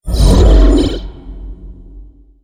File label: wall start; dark seer